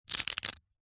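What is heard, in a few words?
leisure poker chips stack quickly in hand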